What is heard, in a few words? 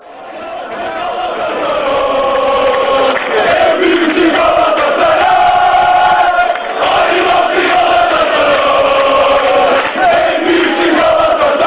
go on attack, fanchants; galatasaray